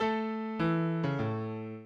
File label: minuet, mozart